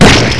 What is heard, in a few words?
hit by something; Macworld (1998-10); Games World; Shareware Games; Arcade; idin 2.0